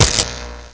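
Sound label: damage; Dino Crisis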